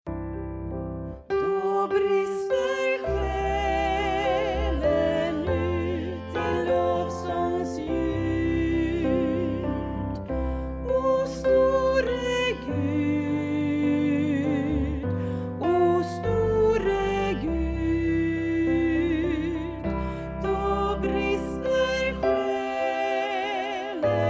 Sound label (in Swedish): O store Gud SATB A